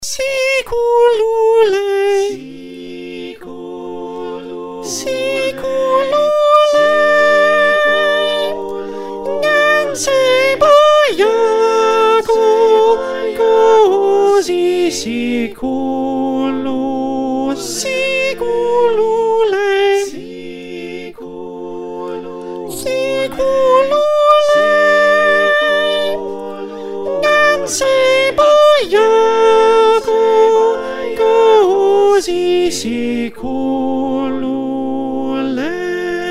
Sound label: Descant